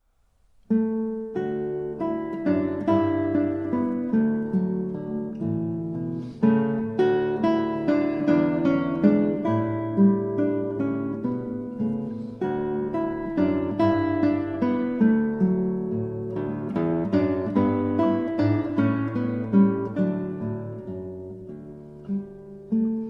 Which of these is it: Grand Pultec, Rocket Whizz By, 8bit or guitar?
guitar